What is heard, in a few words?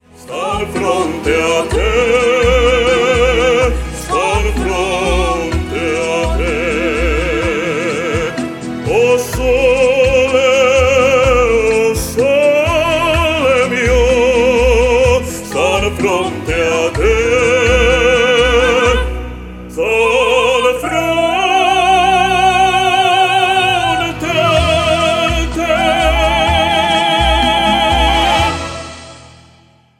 O Sole Mio Vocal